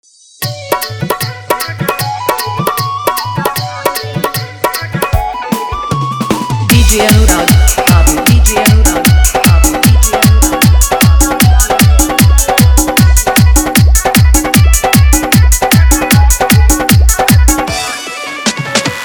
Bhojpuri Dhollki Mix

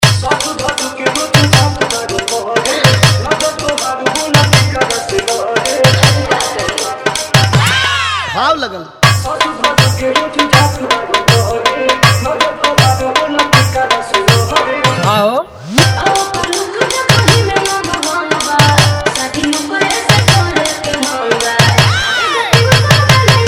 Bhojpuri Remix Song Full Barha Mix

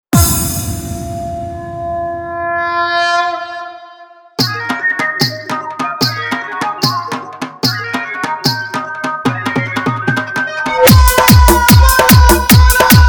Bhakti Dance Mix

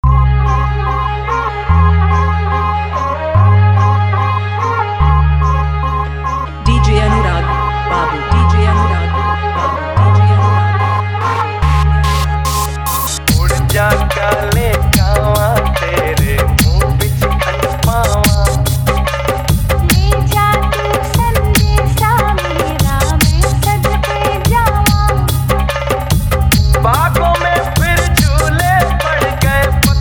Full Dhollki Dance Mix

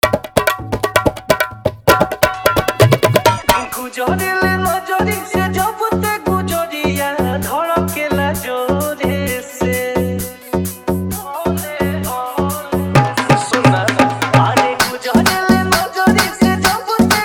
Dhollki Bass, Bhojpuri Dj Song

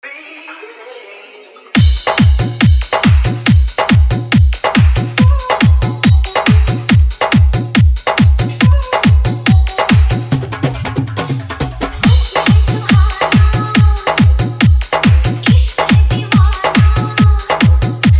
Hard Dhollki Dance Mix

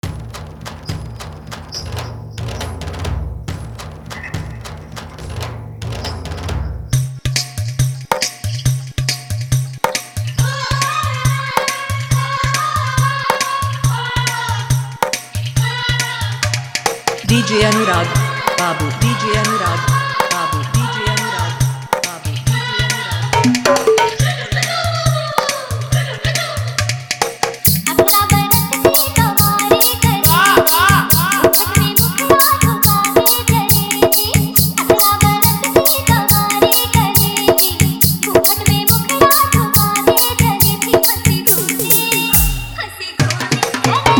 Jhan Jhan Bass Dance Mix

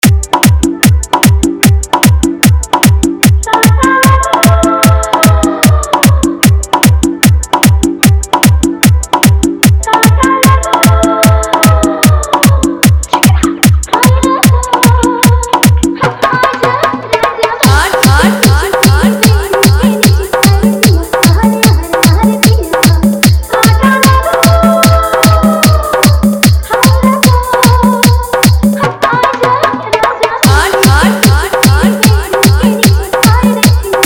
Faadu Dhollki Mix

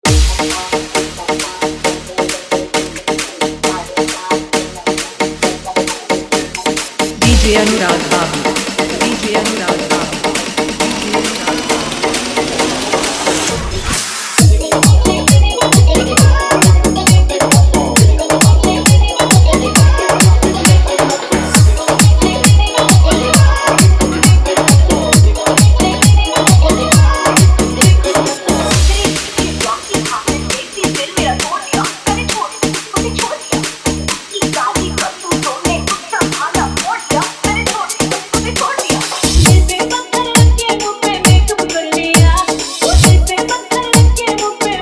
Full Electro Trance House Mix

